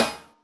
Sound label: Ana We Rim, Electric Snare, Yamaha DTXpress